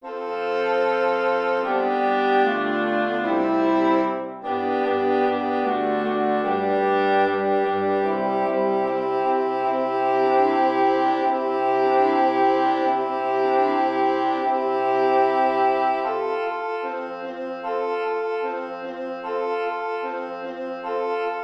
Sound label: tutti